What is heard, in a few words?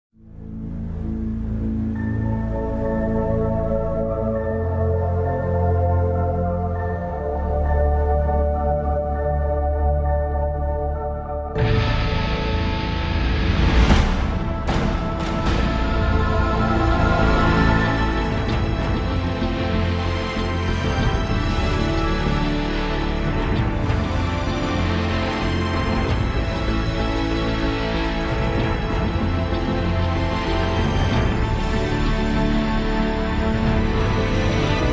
intro music; stargate universe